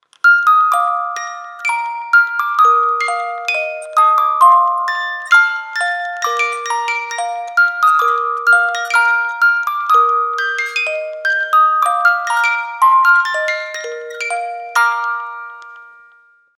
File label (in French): La Flute